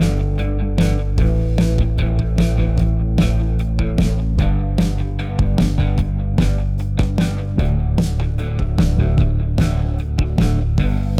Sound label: wave music